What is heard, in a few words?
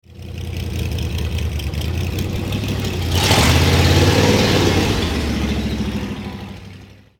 GCS Special, engine; 2003 Skope Classic; Ruapuna Raceway, Christchurch, New Zealand